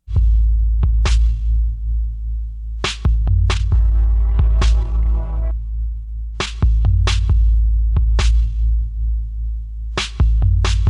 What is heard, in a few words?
low key